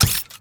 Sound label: Ball Flash Reverse; orianna